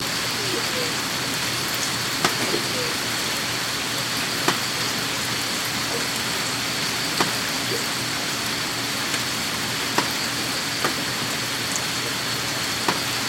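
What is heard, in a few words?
ambience rain drips crickets Tokyo Japan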